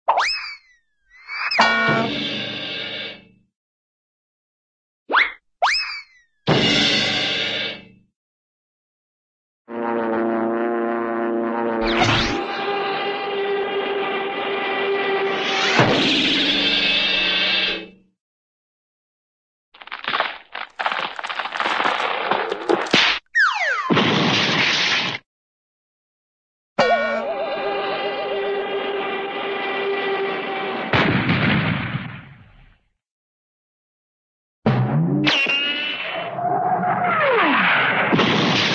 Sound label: cartoon, hit; here's your paper mr. flintstone!